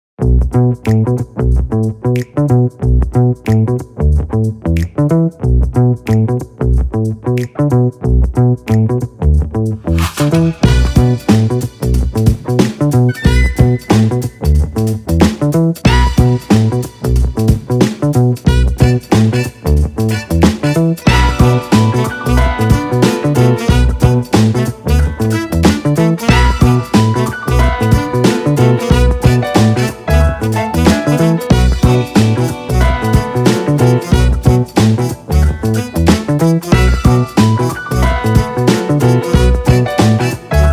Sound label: Funk Jazz Groove Music; MM Blazers GFX